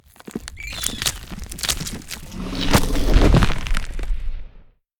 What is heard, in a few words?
Sand Hunter Eat Bug; we are the dwarves gamerip 2016